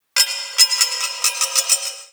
Zariman Sparks; Warframe; The New War; Ambience

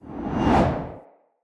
Long Sword Accent; Weapons; Mother

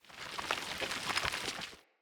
Grunt Foley Summon Dirt; Enemy; Characters; Corrupted Sproutfolk

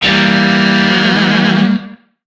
Shawzin Phrygian Chord C; Warframe; Lizzie Shawzin; Chords

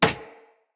Hunter Bow Impact Default, Environment; Weapon Impacts; Player; Bows; Envoy Hunter Bow

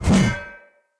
Two Handed Mace Whoosh Heavy E; Weapons; Enemy; Blunt; Sinecure Two Handed Mace

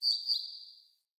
Bird Double Chirp; Galene Bird

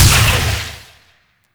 Shot Small, Weapons; Warframe; Duviri; Incarnon Adapters

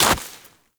Operator Walk Snow, Footsteps; Warframe

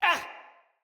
Pain, Soldier Male; Dendrit

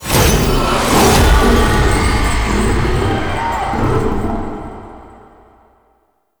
Hard Mode Unlock; Tau; Perita Rebellion

